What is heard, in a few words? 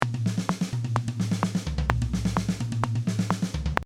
Fill